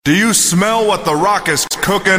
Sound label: rock